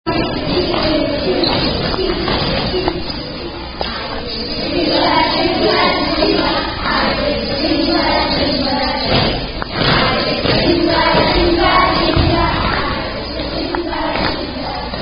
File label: Zulu Warrior Dance; Troop Zulu Warrior Dance; Zulu Warrior (Children's Version)